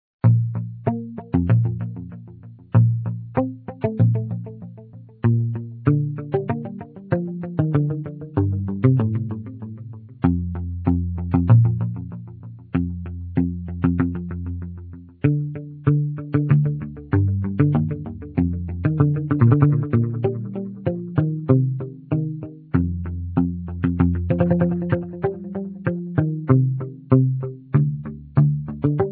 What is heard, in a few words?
echo